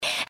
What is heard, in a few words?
breath; pony; Twilight Sparkle